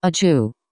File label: achoo